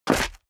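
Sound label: step dress boots concrete grit jump, footsteps; schritte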